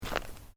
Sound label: Footsteps Boots Road Gravel Step; Footstep Foley; Footsteps (Sound Effects:Human)